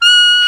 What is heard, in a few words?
SAX A. MP F, Alto Short; Roland LCDP07 Super Sax